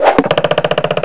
KNIFE, WEAPONS

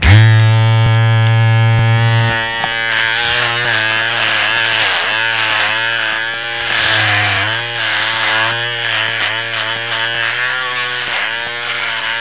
RAZOR

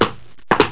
WALK

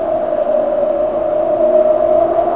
wind soft

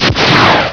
WHOOSH

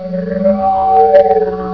flux wow; EFFECTS

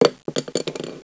PENCIL

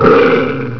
SNARLER, ANIMALS

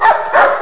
dog bark

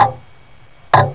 TICK SLOW; ALARMS

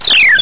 SLIDE HI; EFFECTS

